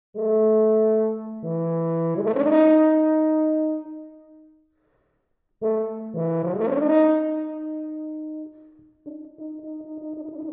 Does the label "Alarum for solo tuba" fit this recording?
Yes